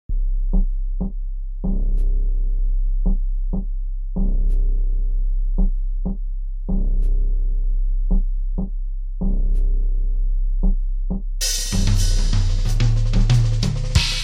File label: song pop; WMBonusXP